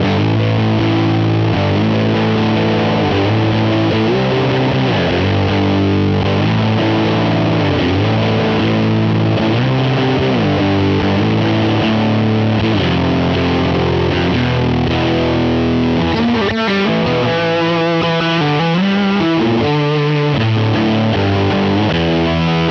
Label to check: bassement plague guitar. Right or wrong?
Right